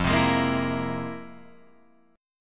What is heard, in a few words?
F Minor Major 11th, Guitar, Drop D